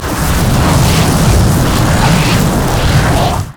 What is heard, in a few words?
frost scorched earth; LoL; shyvana